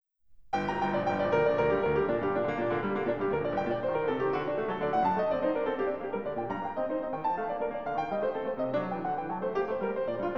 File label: Finale of Solo Violin Sonata; After Bach first version; BWV 1001